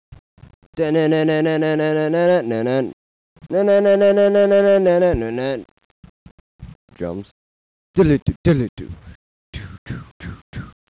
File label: Drums Idea